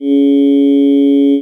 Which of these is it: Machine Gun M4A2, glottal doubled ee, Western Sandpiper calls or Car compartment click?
glottal doubled ee